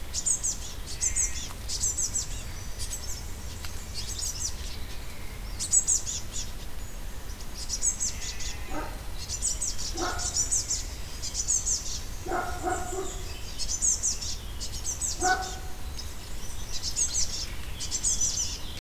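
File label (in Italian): canto verso juvenile cinciallegra parus major great tit carbonero comun; bioacustica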